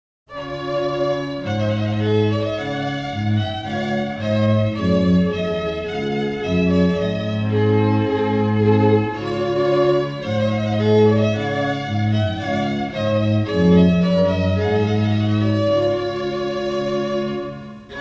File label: Strings; Purcell Tumpet Voluntary; Caprice Strings